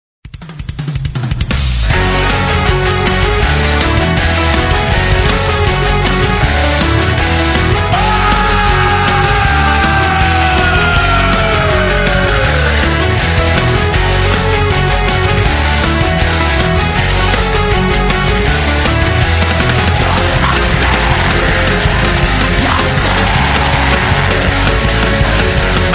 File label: humppa